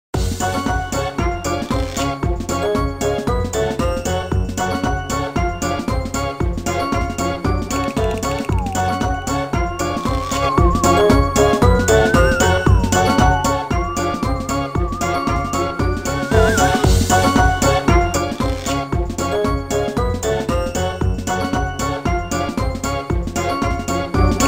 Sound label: Halloween Theme; Dude Theft War; Android